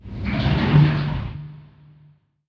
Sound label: water lap; nuke